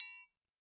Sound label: Triangle Hit Close